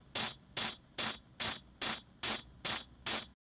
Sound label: drum